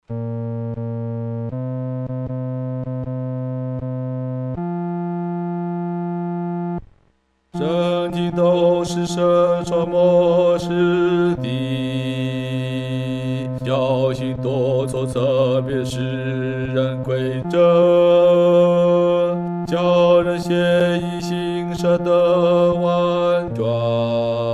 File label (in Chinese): 独唱 第四声